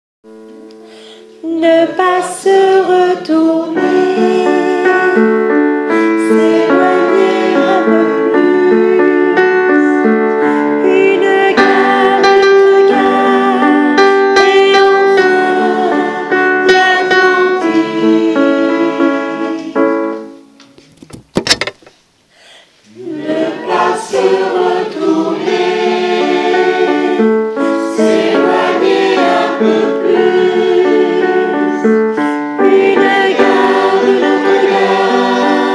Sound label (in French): je vole voix